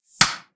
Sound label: cracker bang